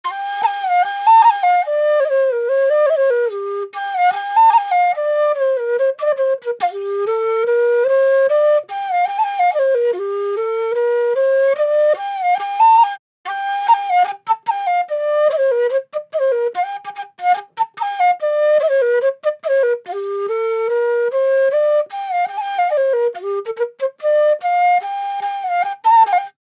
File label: Drill Flute Loop BPM 145 G Min